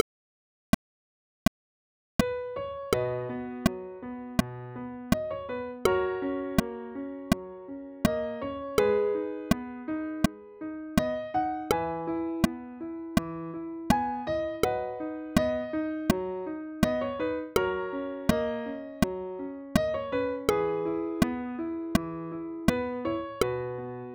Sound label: country road B